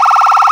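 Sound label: emergency vehicle phaser, sirens; danish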